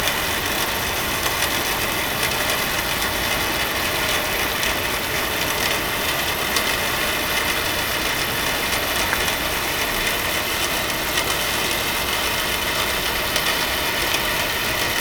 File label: Hydrophones, orcasound